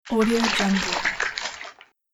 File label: fish water movement